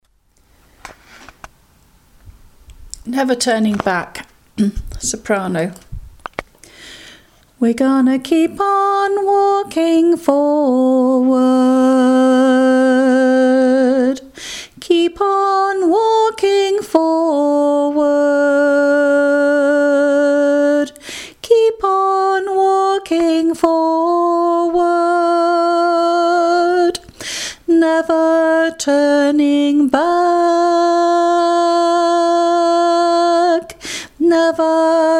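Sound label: soprano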